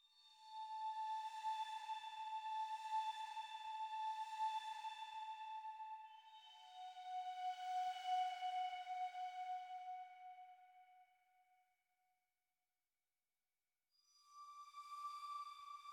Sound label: Tension Low Airy Loop; gamerip 2017; Sniper Ghost Warrior 3